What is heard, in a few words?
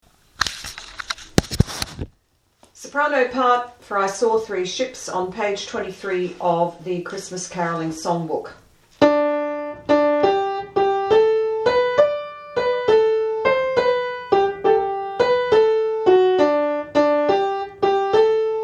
I saw three ships, sopranos; Blue Book